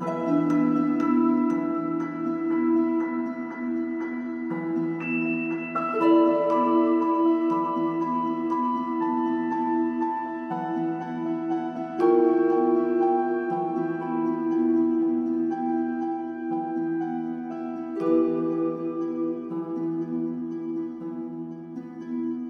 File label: bell Cutscene loop; Aragami